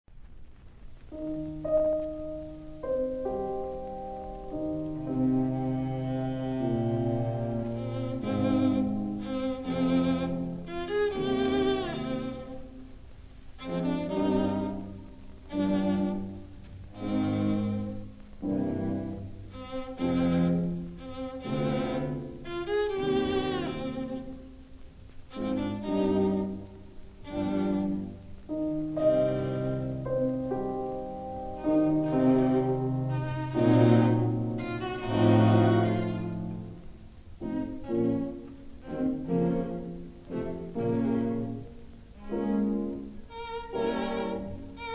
Piano Quintet in E flat In modo marcia un poco largamente; Schumann; Op. 44